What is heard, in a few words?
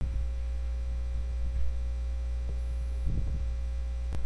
beat